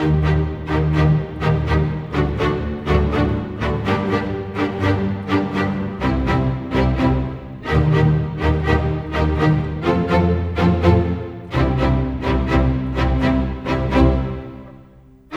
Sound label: Strings; Sonic Foundry (Sony Creative Software); Orchestral Series VOL-4; Rock and Pop; Rock-Pop 18